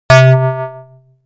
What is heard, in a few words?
Bell A; instruments